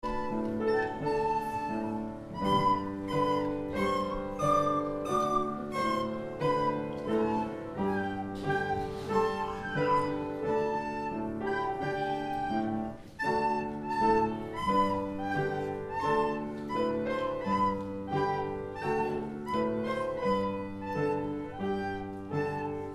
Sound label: Recorders blue belt children